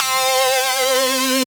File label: C Synth, One Shots